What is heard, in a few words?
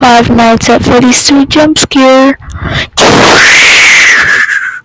jump fart